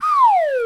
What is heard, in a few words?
Lotus Flute Down